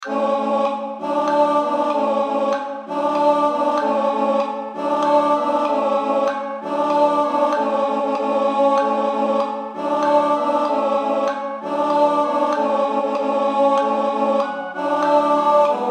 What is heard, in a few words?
Tenor sans insts